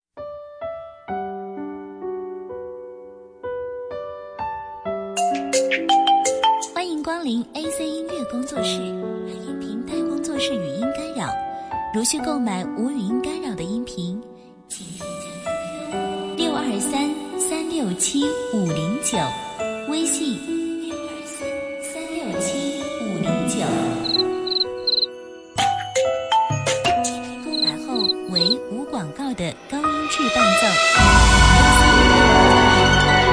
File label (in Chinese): 伴奏 高音质纯伴奏